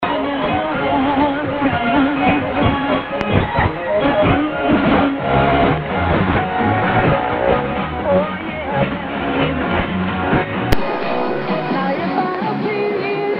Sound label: Synch. Sony Degen Eton